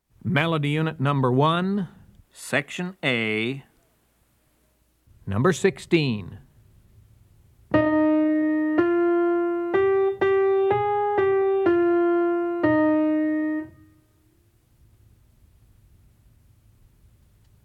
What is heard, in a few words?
melody, ear training recordings